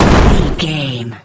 explosion large no tail

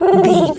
pigeon call calm

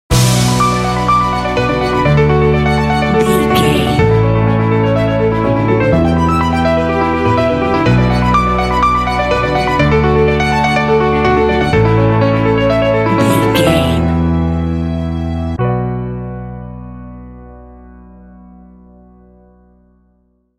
inspirational cinematic beauty and elegance sting

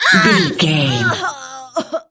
voice female death

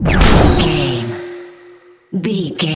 spell harness magic

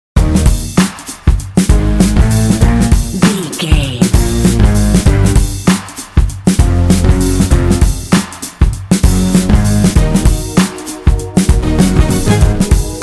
pop fun hip hop bed mix catchy upbeat funky happy

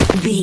footstep grass land

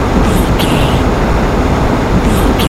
sci-fi vehicle spaceship jet engine loop

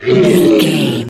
troll monster hurt pain long

